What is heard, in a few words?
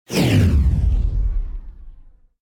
Fire Ball Firing A Fire Ball; Hollywood Edge; sound designer's toolkit v2